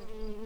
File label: bumblebees, Hypnorum workers